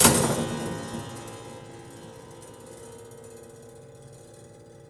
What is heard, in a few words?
Prepared Piano; Battery 3 Sample Kits